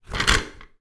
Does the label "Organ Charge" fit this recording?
No